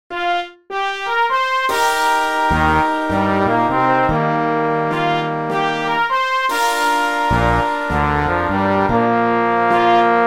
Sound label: Rhythm Blues